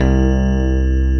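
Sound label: grand piano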